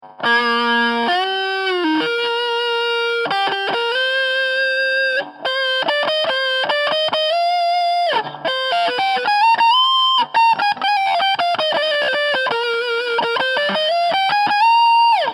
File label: Tone, guitar; Brian May Guitar Tone